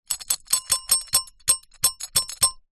bell small reception desk bell multiple rings broken